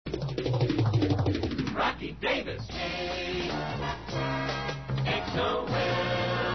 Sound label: jingle; rocky